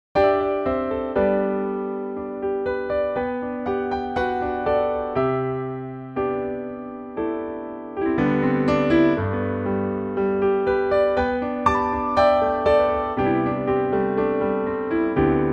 G piano

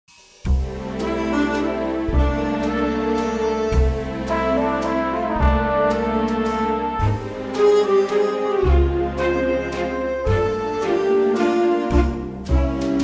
F orch

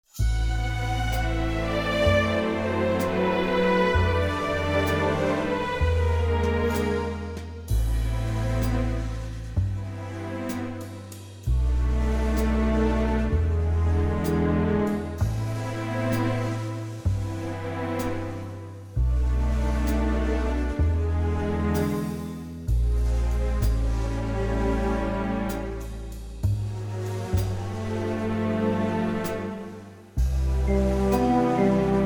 Am orchestral

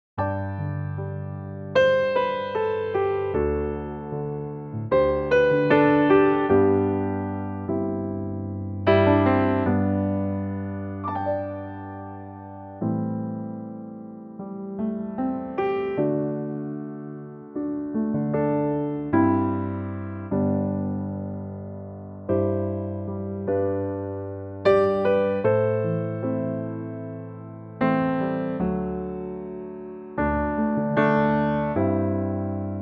G to Ab Piano

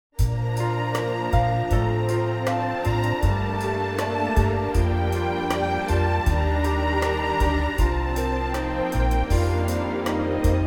orchestral Bb; key - Bb - vocal range - Bb to D

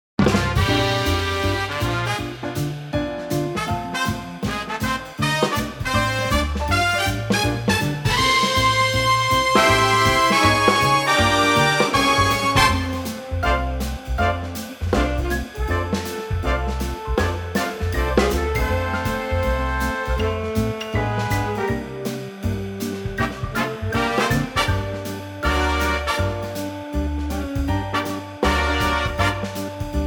F 160bpm

